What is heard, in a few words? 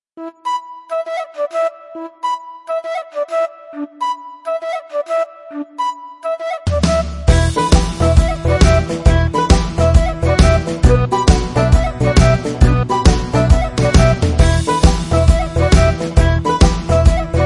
Folk dance